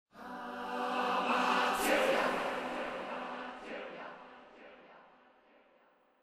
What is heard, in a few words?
MUSIC